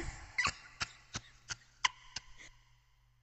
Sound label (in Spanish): risa; zumito